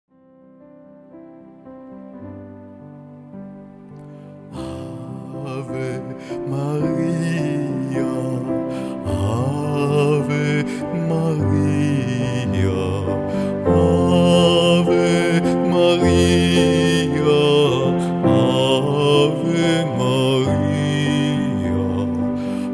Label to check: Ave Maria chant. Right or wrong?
Right